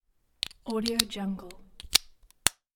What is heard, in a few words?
putting wireless headphones in case